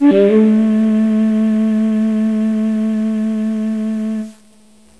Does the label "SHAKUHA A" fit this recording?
Yes